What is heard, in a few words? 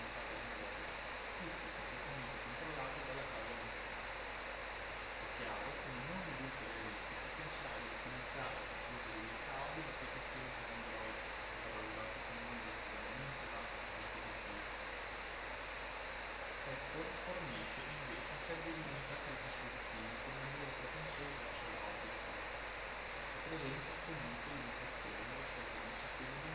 Noise Test Noise Source; Cardio1; Noise+Speech